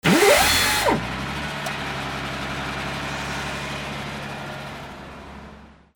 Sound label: VEHICLES